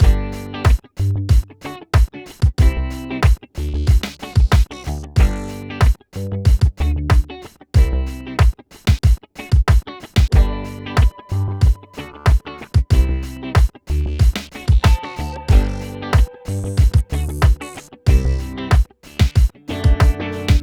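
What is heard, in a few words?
Pop Combo, Gmaj, 093 bpm; Digidesign; Pro Tools; Pro Tools Essential Loops; Pop Rock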